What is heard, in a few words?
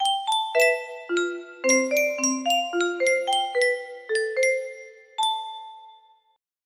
melody